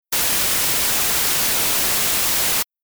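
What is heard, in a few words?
Synth oscillator Noise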